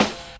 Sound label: 90s subby rap steel snare drum sound g sharp key